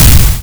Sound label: explode